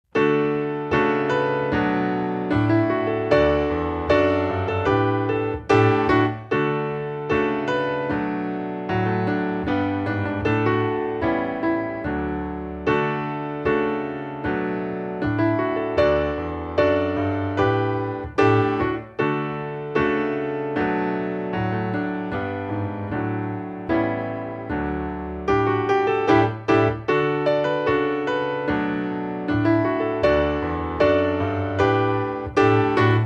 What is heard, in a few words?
accomp